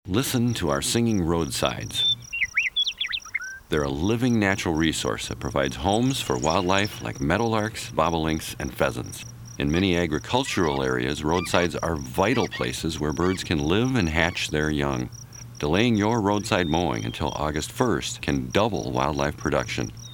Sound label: singing roadside